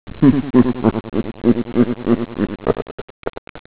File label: chortle